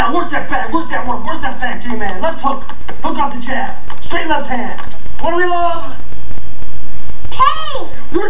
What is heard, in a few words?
pain; "What do we love? PAIN!!!"